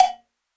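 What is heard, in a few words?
Cowbell, Hip-Hop & Lofi Drums; One Shots; Ghosthack; Drum Mega Bundle Demo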